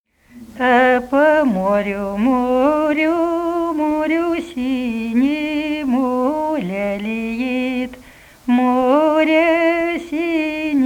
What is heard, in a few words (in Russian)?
А по морю, морю, морю синему (апрельская); Музыкально—фольклорный депозитарий